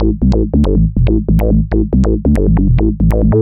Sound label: bassline, dance